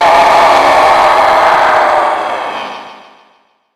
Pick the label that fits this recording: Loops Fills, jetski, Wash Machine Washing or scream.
scream